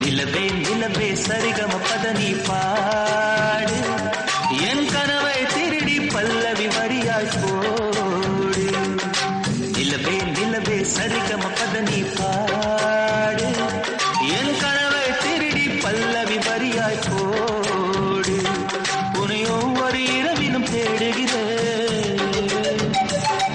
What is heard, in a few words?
Tamil Ringtone; Periyanna